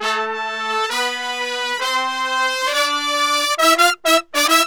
BRASS SAMBA

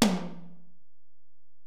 Tom, Alesis DM-PRO

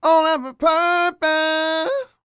SING, Vocal Planet R&B & Dance; ILIO

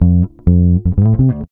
REAL BASS, BASSLICKS; Ueberschall; Jam Box

